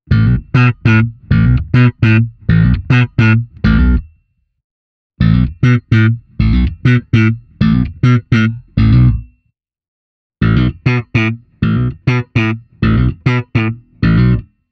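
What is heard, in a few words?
slap, Bass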